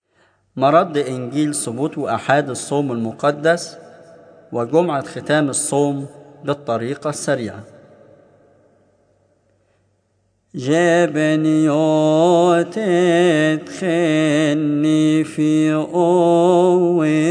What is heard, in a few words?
Minor Gospel Response